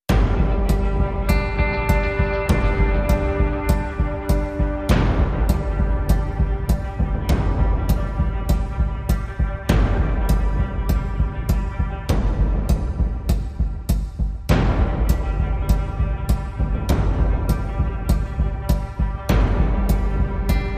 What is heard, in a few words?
No Piano